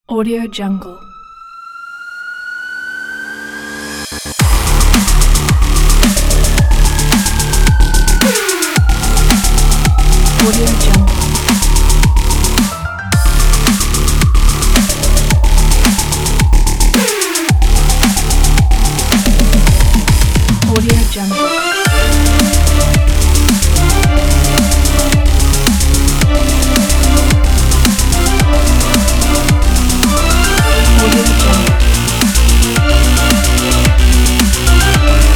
action epic edm

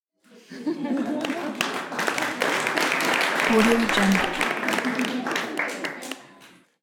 indoor crowd applause with a soft laugh; debsound

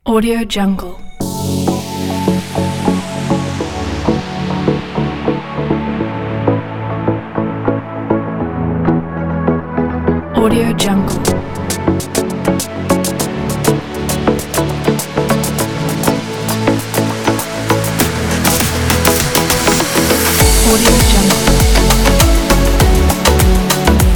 tropical summer pop

